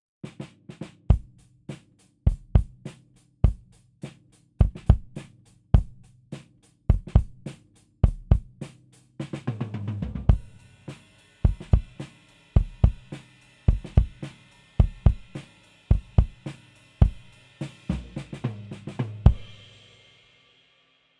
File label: EV-PL20 Kick Out Full Set